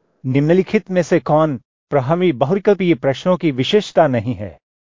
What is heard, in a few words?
techno